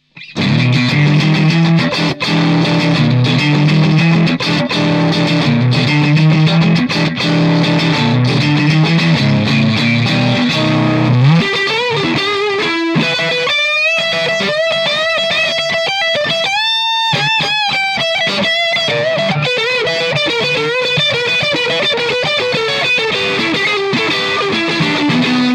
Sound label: Strato Boost; alltube preamp